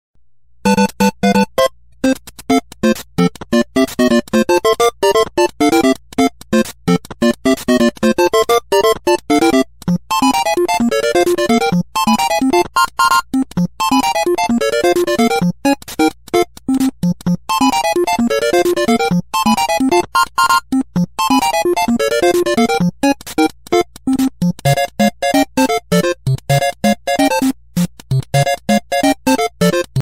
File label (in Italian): Musica Casa di Toad; NSMB